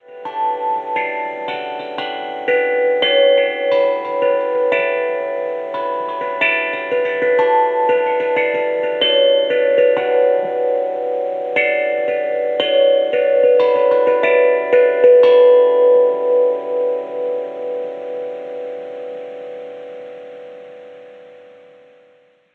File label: alarm hang drum; Mudita Pure